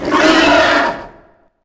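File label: troll voice attack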